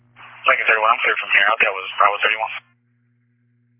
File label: police